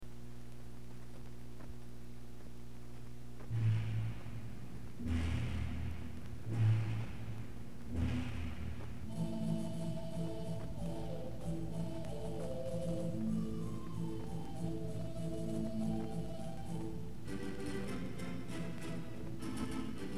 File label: Theater Organ